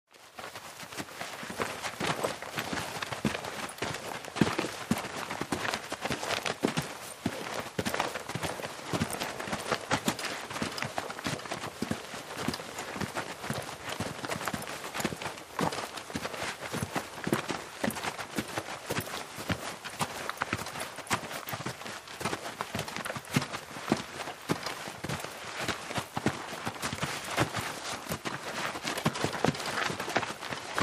Footsteps Group Sol

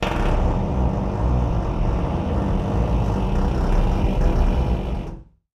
door creak med, squeaks

